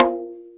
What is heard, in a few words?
arabic perc, Arabic Percussion Kit